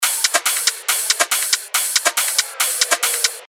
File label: 140 BPM, techno loops; Tokyo; revealed techno top loops vol 8